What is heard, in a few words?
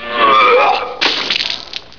barf